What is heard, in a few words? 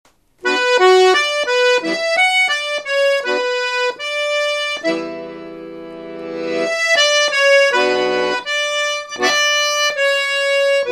Giulietti F4T FB MMH; Giulietti-F4T-FB-MMH-Remote